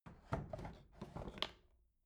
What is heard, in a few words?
bathroom drawer open rattle